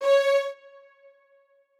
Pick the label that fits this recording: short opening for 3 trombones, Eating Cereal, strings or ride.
strings